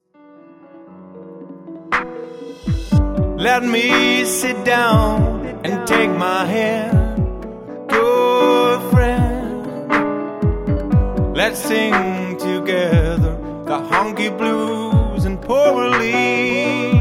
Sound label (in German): piano solo ausschnitt